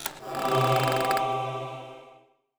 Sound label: GBG splash sound; Pixel Boy and the Ever Expanding Dungeon